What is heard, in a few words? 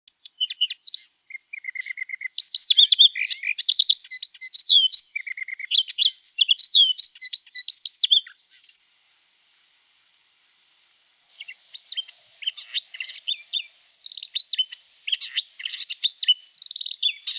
redthroat